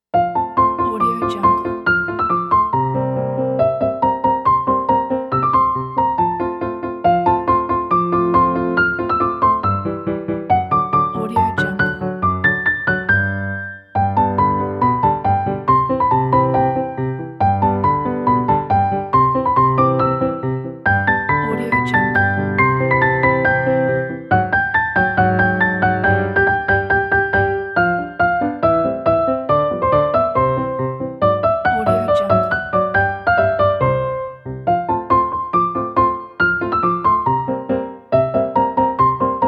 the funny piano

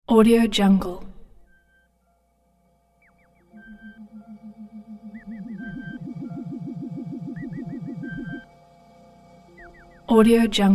spaceship reentry sequence; sound ideas

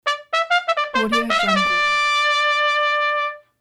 spanish ole trumpet

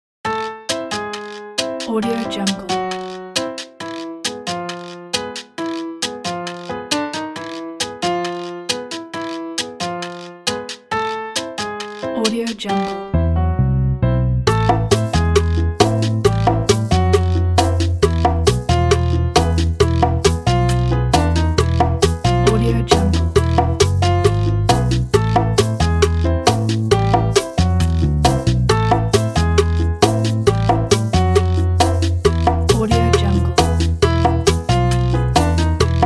upbeat happy summer

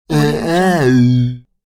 zombie inmate

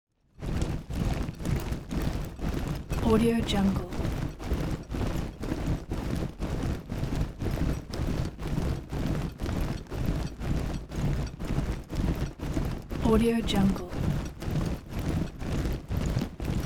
armor troops leather rustle march; foley; smartsoundfx

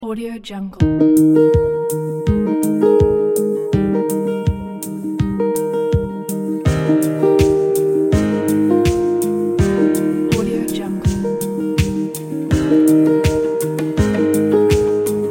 acoustic ambient inspiration

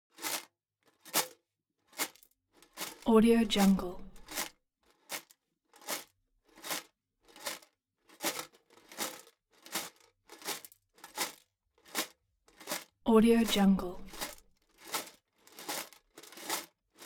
foley armor troops metal sheet clatter march slow; smartsoundfx

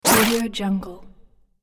space platform jump

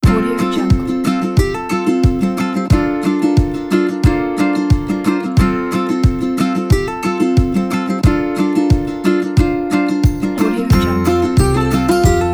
family ukulele lovely day vacation

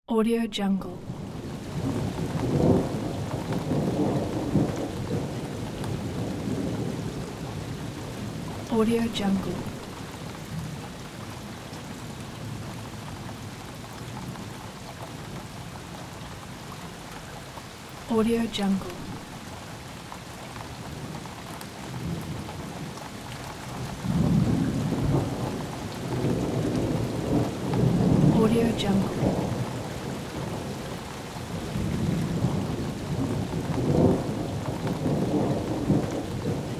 light rain with thunder

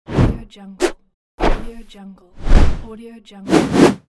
whooshes

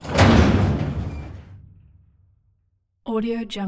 mechanism cargo door open; soundmorph

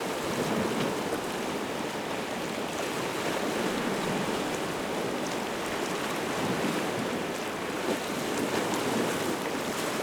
heavy sea waves hitting breakwater loop

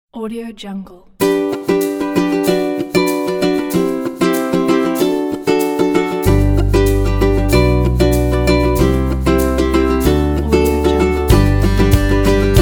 happy folk

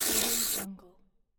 game futuristic electricity sound

